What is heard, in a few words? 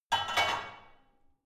barbell only replace unsteady; sport freizeit; fitnessstudio